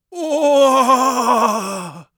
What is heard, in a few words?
death, Mage Voice Pack